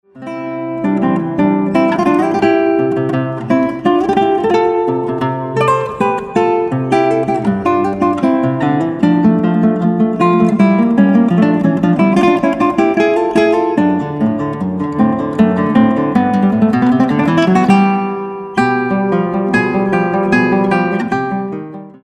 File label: MINUET